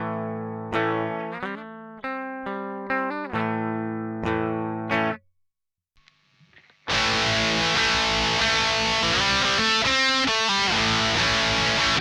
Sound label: Vox Valvenergy Cutting Edge Cab